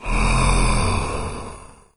idle breath; doom3; commando

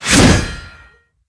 swing horizontal

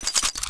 semi deploy, semiauto

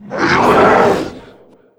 attack

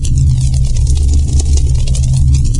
fireball flight; doom3

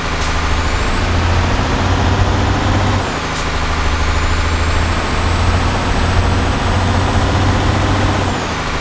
third, vehicles cars; truck actros; lwcars

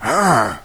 zombie sight; doom3

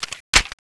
clip out; tfa cso; pgm

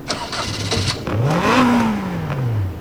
startup, caterham r500, cars; lwcars

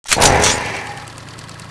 bolt pull, weapons; cso; turbulent5

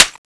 clip in; tfa cso; gilboa

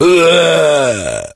zombie take damage

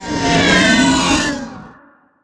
spider hail

